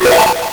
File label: LSDj, Nintendo Gameboy; 300 Drum Machines